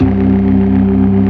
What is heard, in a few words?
organ, all stops; Keith Emerson Signature Series